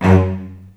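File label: F#1, VCS arco, strings, L; East West; The Ultimate String Collection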